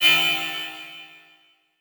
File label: Flash Beep Mechanical; Multimedia Internet CD-Rom Flash